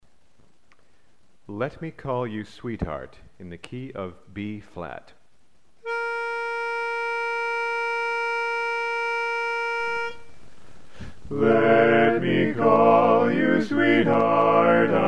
Learning Lead, chorus

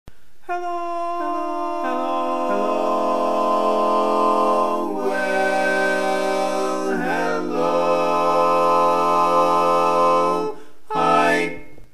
Hello Bari

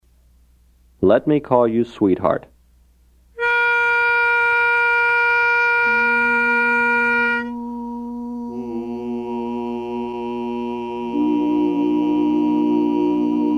tenor

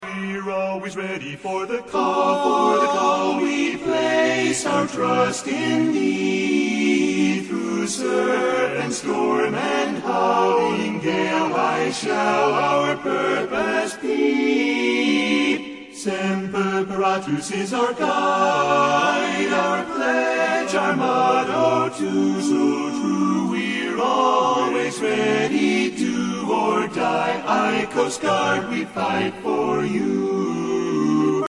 armed forces medley Coast Guard lead, chorus

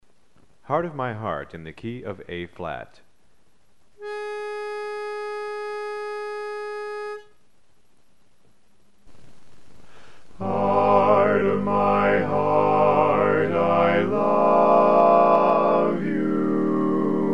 Lead

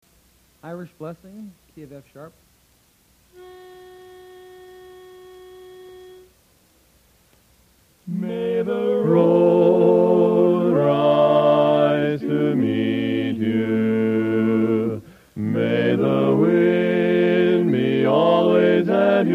Irish Blessing, chorus

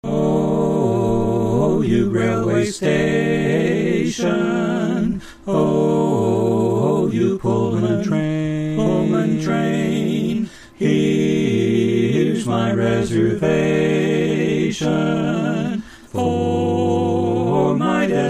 bass

